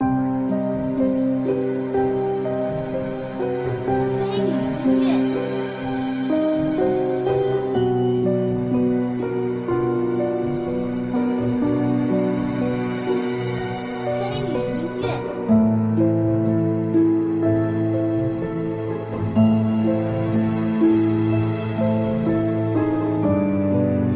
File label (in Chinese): 黑夜